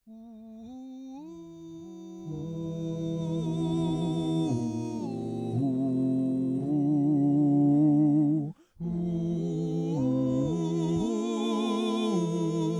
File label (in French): Baryton; The Simpsons